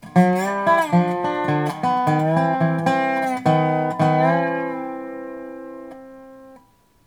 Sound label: hawaiian lick